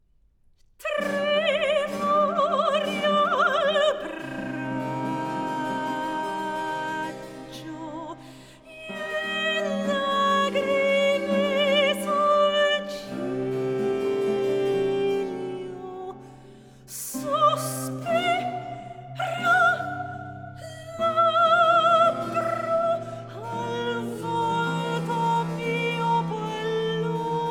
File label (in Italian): Cantata Recitativo; Tremori al braccio RV 799